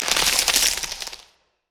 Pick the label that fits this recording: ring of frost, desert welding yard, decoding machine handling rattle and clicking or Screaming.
ring of frost